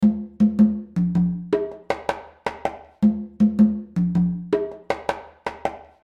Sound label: Perc Small Room